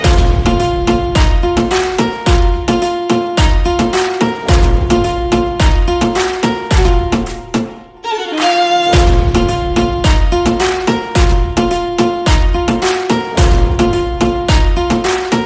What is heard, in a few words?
instrumental ringtone